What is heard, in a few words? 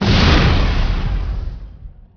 rocket trooper; Emperor - Battle for Dune